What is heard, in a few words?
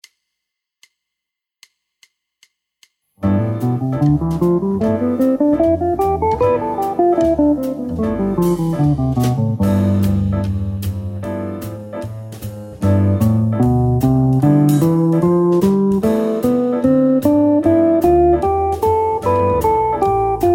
mixolydian guitar mode